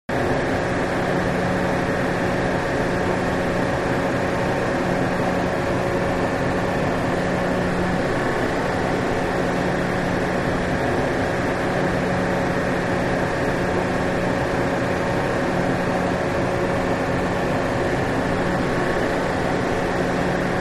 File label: Industrial Ambience, warehouse & sound stages